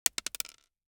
DICE on Hard Wood, Throw and Roll, Mini, 1 One Die; Samples; ArticulatedSounds; DICE Sfx Party (Extended)